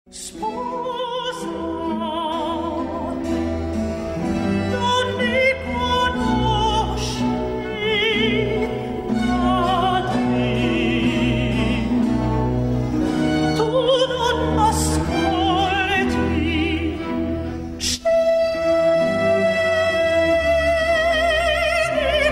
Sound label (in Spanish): contratenor